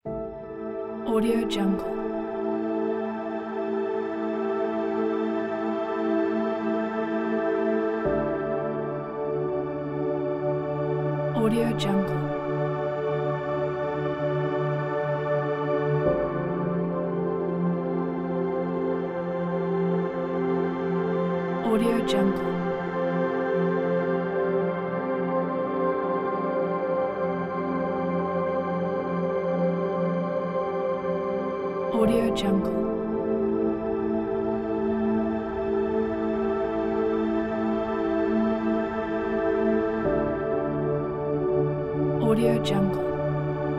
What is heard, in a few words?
meditative soundscape